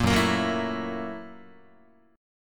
Gb 7th Sharp 9th Gb Guitar; strummed; Gb7#9; 1,2,1,4,1,3; JGuitar

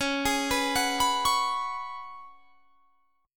Db 7th Suspended 4th Db down; arpeggiated

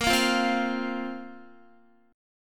A sharp Suspended 2nd down Guitar

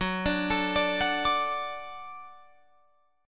Gb Minor Sharp 5th Gb down Guitar Open F; Gbm#5; arpeggiated; JGuitar

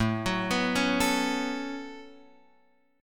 A 6th Add 9th Guitar Open F, chord; A6add9; arpeggiated

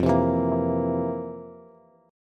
F Major 7th Suspended 4th F, Bass; FM7sus4 strummed; JGuitar

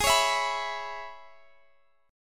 E 6th, Ab

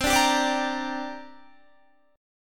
C 7th Suspended 2nd, Guitar; C7sus2 strummed; 20,17,17,19,19,17

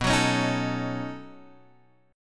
B 9th B; B9 Chord {7 X 4 6 4 5} strummed

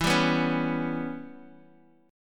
E Minor 6th E, Guitar Open D

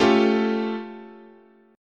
A-Augmented 7th, chord, guitar

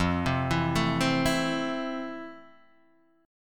F Major 7th F; FM7 Chord {1 3 2 2 1 0} arpeggiated

